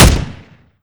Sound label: ar2 single